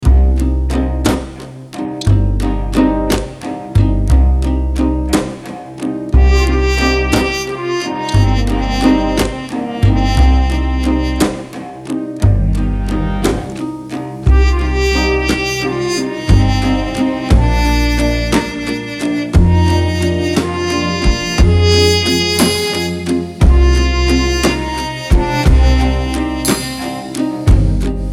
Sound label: italian nostalgia loop european traditio